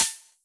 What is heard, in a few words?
Snares, Elektron MachineDrum SPS1 MKII